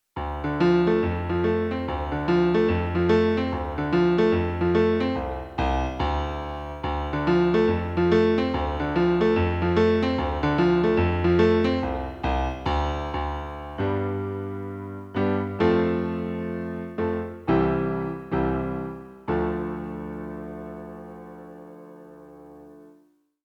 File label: piano